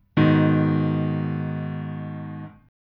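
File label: G sharp m lowest, chords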